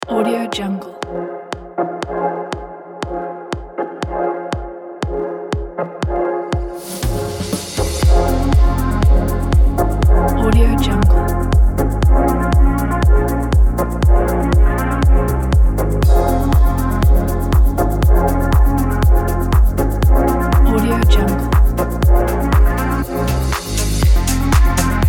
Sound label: abstract deeply house